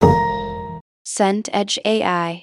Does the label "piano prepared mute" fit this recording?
Yes